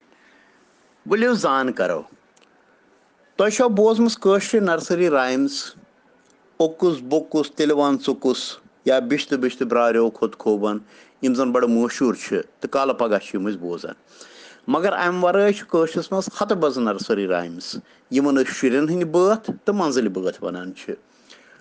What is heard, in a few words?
Children Rhyms in Kashmiri